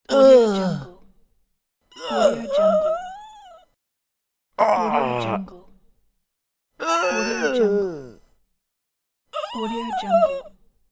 death voice

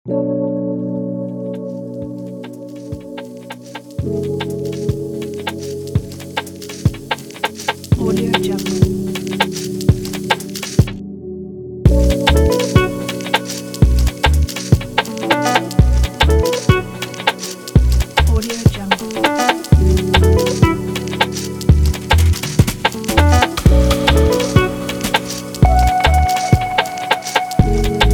jazz cafe kit

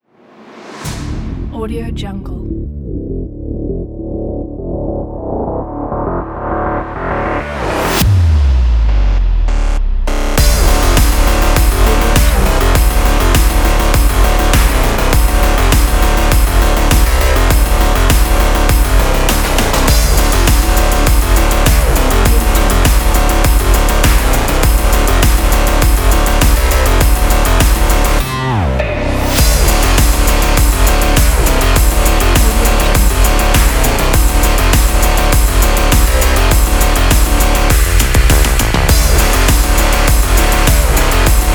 electro sport; fishermann

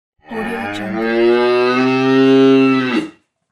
cow

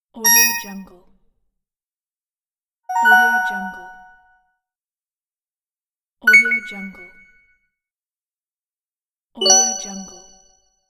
advertising ding sounds

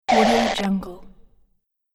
short laser interference

